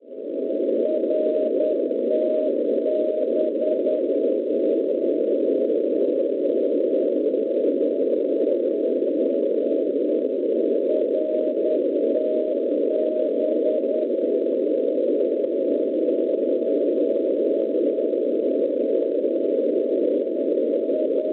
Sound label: NDB 415 kHz; RTB; Nuernberg; Schwarzsee